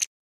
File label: roulette set chip